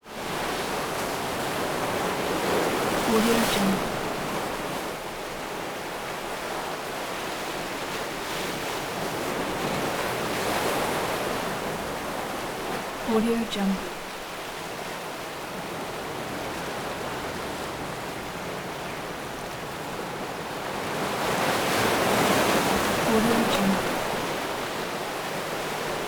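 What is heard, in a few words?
surf break up on rocks close up wave impact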